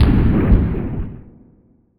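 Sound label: explode distant; hl1 weapon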